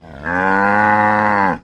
Cattle, Cow Moo, Bellow. Single Low Moo Bellow. Close Perspective.